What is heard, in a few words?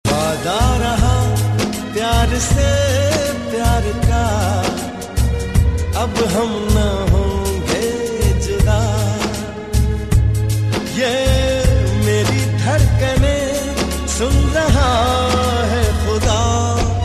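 Male Version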